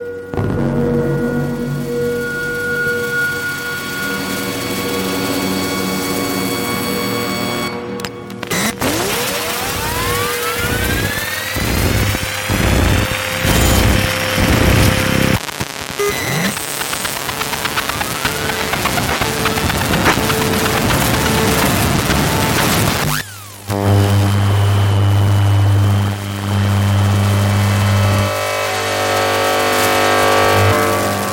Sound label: Electromagnetic Waves; Noiiz